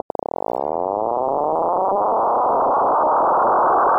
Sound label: clicks